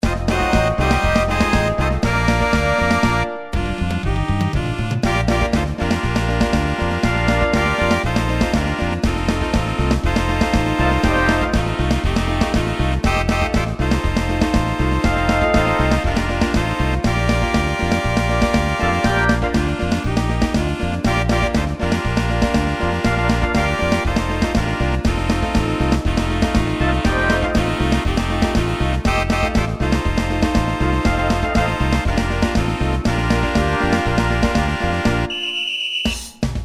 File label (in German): Samba